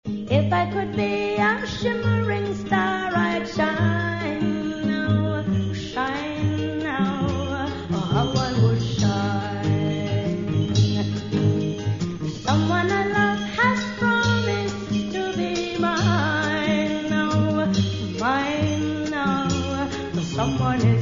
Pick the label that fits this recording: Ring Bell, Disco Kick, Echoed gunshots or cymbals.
Ring Bell